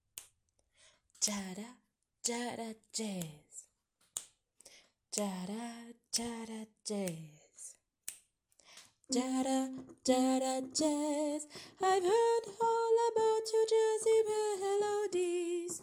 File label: part 1 alto; de I à fin